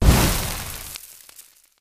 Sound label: player hurt fire hurt; Minecraft; Votive World